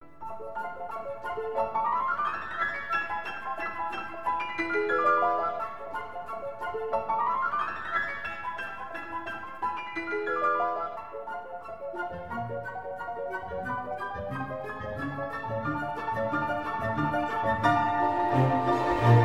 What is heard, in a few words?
Piano Concerto No 2 in A Major, Allegro animato; Franz Liszt; S 125